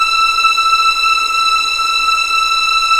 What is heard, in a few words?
E6 EXP MF, VIOLINS; East West; The Ultimate String Collection